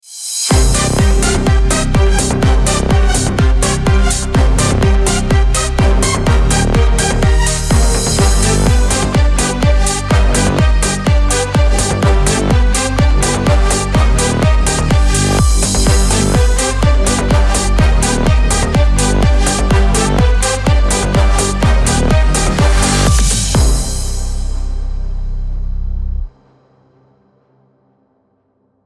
dance house music background for short video; funny

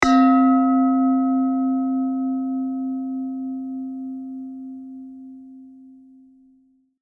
effect sound copper bell; alarm

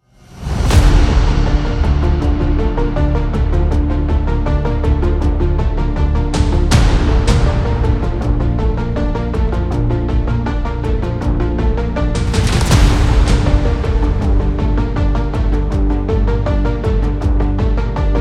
warrior medium, war background

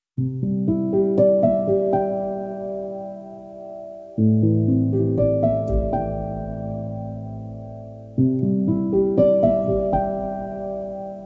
background, peaceful

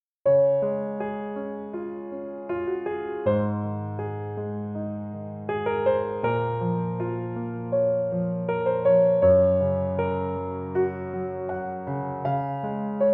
beautiful piano

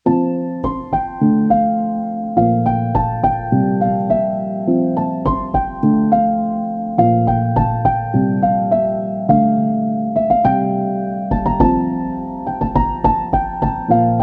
background morning, peaceful

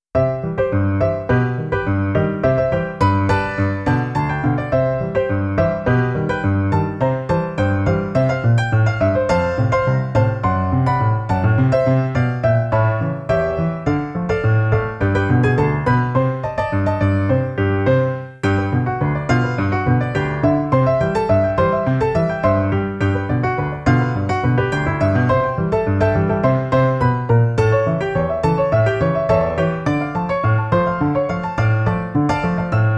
comedy, funny background

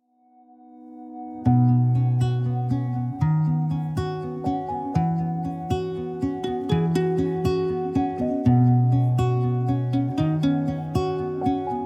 march

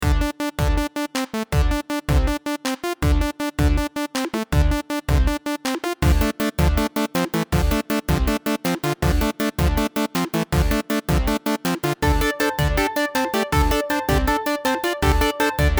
background bright, funny

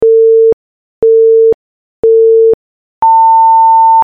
race start beeps; effect; countdown

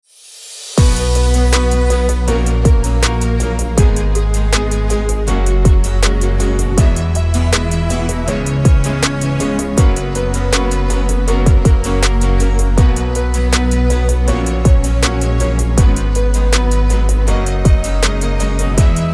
cheerful positive funny short background music; inspire; for video

